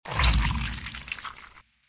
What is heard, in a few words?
boom